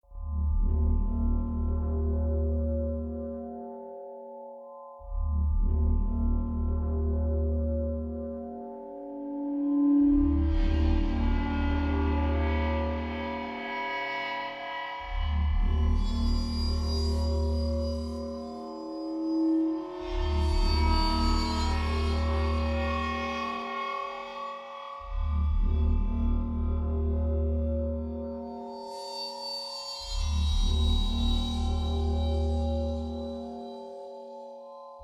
city ambience; F.E.A.R. 2: Project Origin

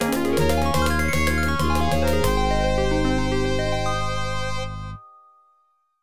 Victory!; comet summoner soundtrack

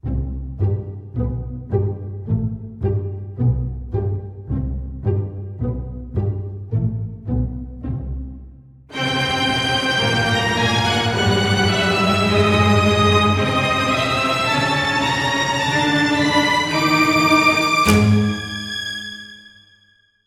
strings; hot lava; ios macos windows

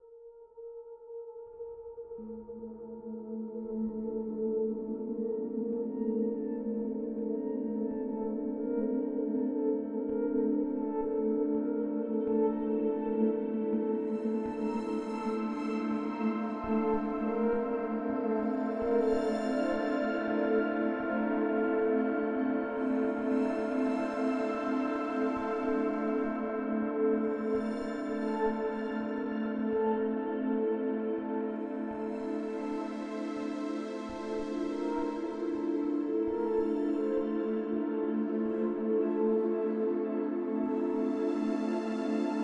DRONE; escape academy

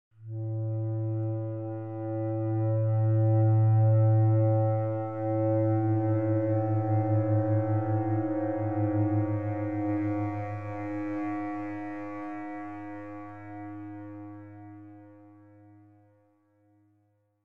Scary; The Black Mirror

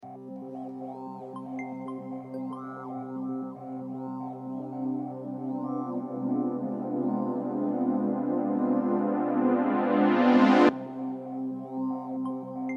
Spooky Digital; A Summer with the Shiba; PS4

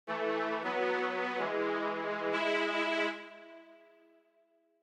Town Intro Strings; backpack hero gamerip 2022

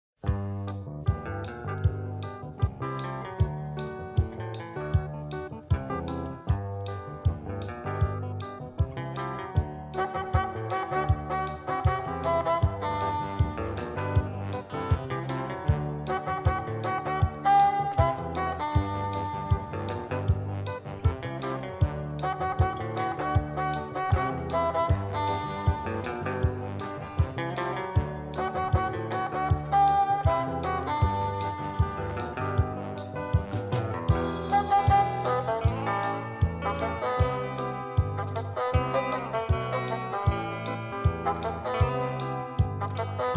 Lo-Fi Instrumental; Lo Chiamavano Bulldozer; Bud Spencer Terence Hill Slaps and Beans 2

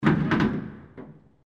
Accordion door closing; Robobeat

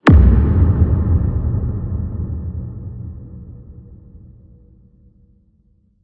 UI Sting Boom

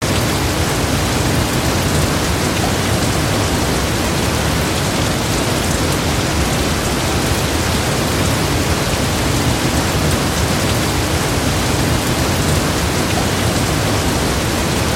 ambient rain inside; bgm

